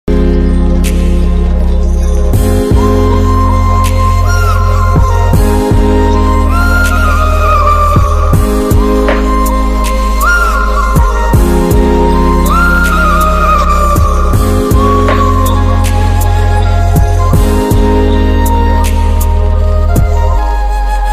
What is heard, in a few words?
lofi ringtone